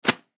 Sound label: Paper Towel Dispenser; Paper Towel Dispenser Manual Grab Tear Sheet Public Bathroom; Kitchen; Industry; Blastwave